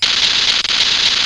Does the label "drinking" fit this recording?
No